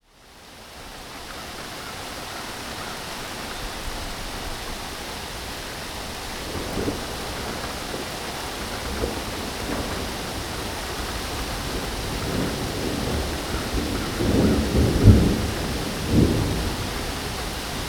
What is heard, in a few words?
rain thunder and crows